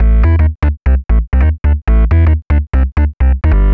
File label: Bass, uk garage, 128bpm